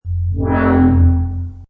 Sci-fi, door or panel, force field or cloaking sound; Science Fiction Sound Effects